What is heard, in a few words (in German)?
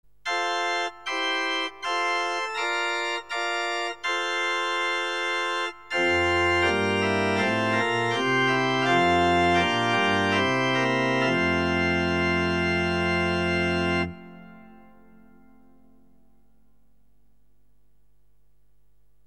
grossmix, sakralorgel